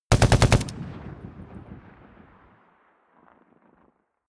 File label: rifle ak-47 exterior fire burst big; Firearms; schusswaffen